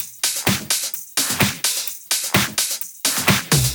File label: Electro Loop 128BPM; Vengeance Samples